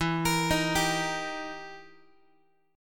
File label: E Minor Major 7th Flat 5th E down Guitar; x,12,13,13,11,x; 4A,4E,5A,5D,5G#,6C#; arpeggiated; JGuitar